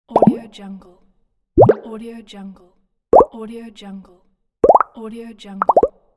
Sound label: pop up